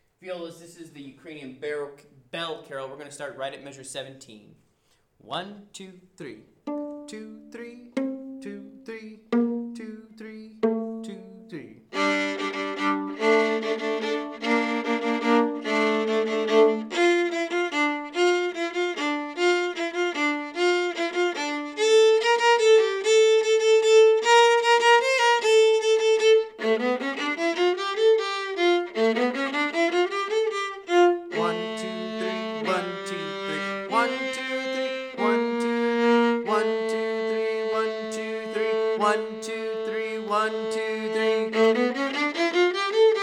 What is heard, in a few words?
Carol O Bells Viola